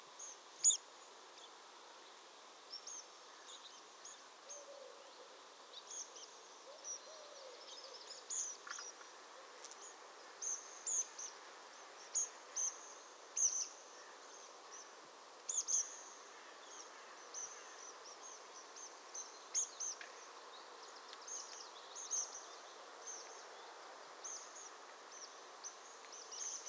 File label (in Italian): Prispolone; fauna